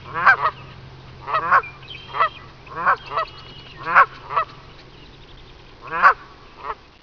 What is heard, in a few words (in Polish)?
bernikla kanadyjska